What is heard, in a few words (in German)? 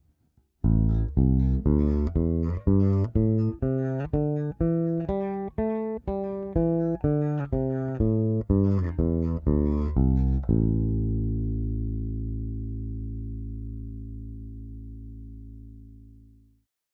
Aguilar Super Double Bridge PU aktiv